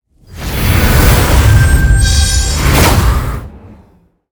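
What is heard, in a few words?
trap dark death; monster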